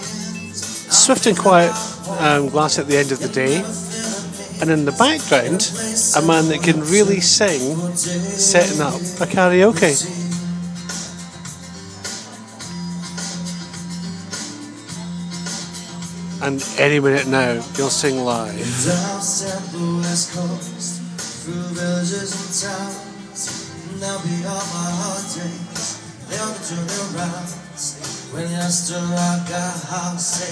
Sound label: karaoke bloke that can sing